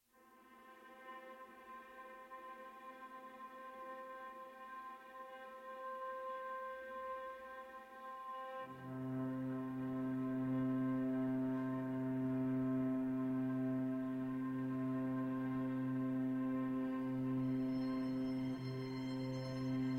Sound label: String Quartet